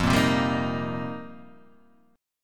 F 7th Suspended 2nd Suspended 4th F; x,x,3,3,0,2,0,0

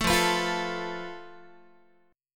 F Major Flat 5th Guitar, chord; strummed

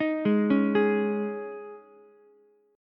C sharp Suspended 2nd, C sharp, Ukulele; C#sus2; arpeggiated; JGuitar

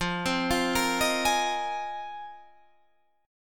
F Minor 11th, Guitar Open D

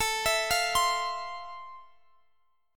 F Major 7th, A

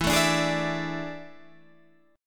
A Major 7th Suspended 4th E

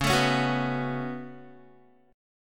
Db 7th Suspended 4th Sharp 5th Db down Guitar

